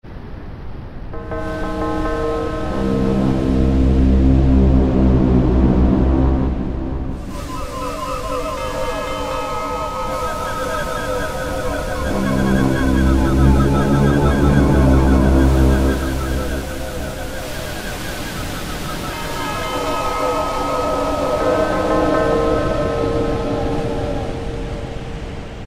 Sea Storm, Korg N364; Factory Combi demos

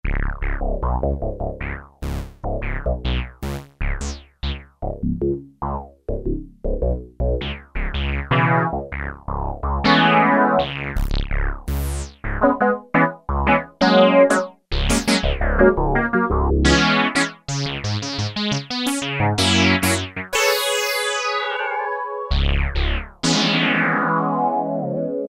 Touch Poly Synth, Roland MKS-70

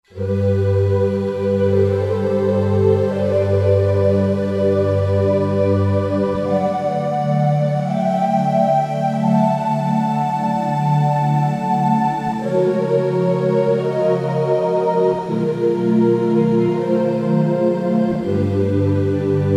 Cosmic Dawn, Roland JV-2080

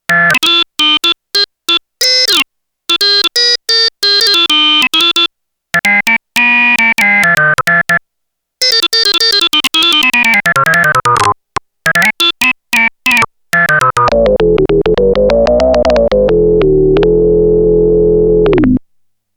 JAZZ ORGAN II, Roland SH-101; Factory Patches

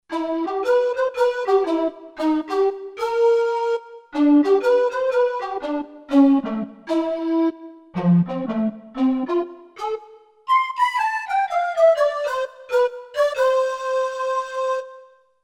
Synth Calliope

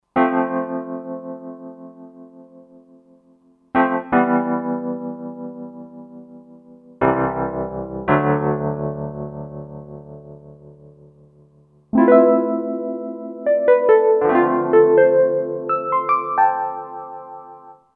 Tremo Wurly; '60s & '70s; Roland SR-JV80-08

